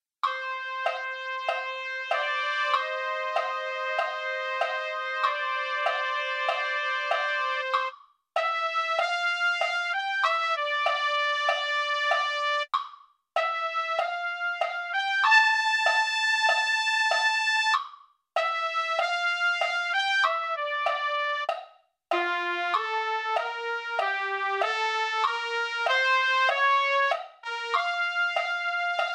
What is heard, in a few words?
trumpet, MIDI